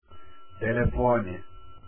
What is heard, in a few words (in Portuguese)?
telefone